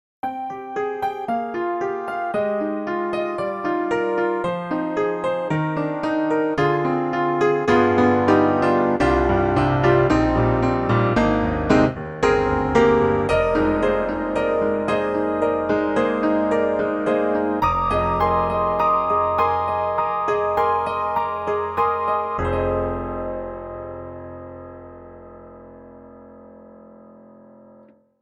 KORG KRONOS 2 Piano and EP; Berlin

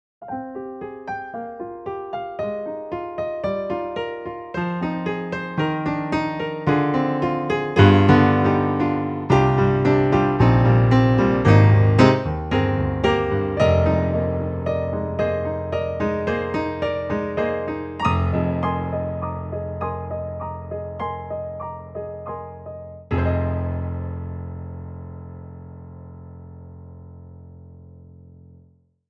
EDIROL Super Quartet

